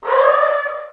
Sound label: ragged zombie stand